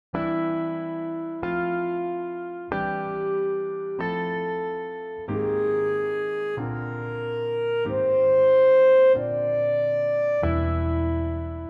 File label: rising melodic phrases